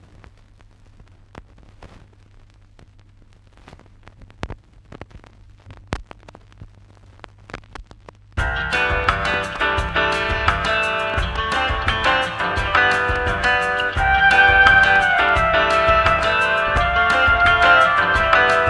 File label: bossa; springyspringo